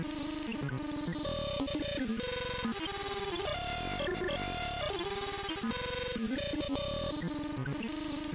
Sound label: combinator; factorio 2.0.55